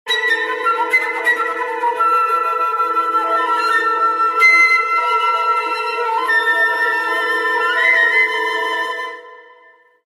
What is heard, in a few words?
flute melody; ringtone